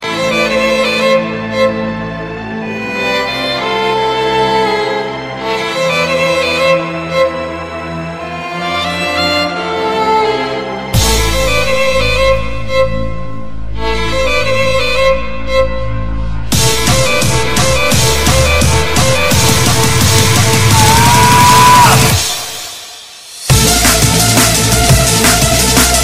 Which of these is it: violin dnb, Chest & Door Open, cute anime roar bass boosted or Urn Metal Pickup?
violin dnb